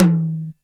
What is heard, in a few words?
Tom High, Drums, Roland MT32